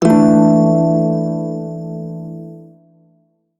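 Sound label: pentatonic major chord, shawzin; Warframe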